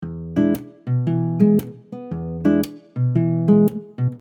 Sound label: Percussive E7 115bpm, Soft Nylon Guitar; Percussive Patterns; MG Soft Nylon Guitar - MIDI Pack